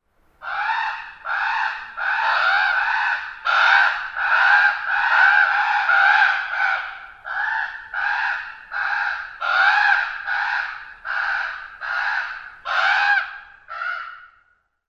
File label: fledgling calls